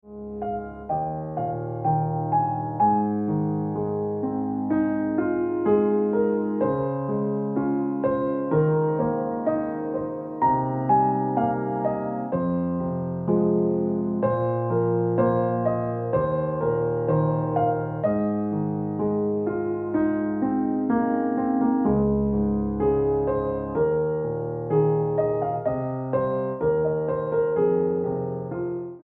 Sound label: Ringtone Piano Music